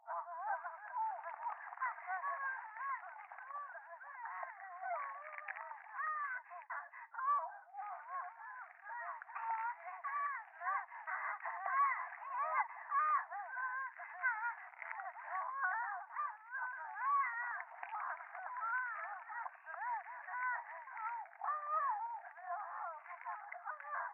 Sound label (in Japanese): 電話 低音喘ぎ 触手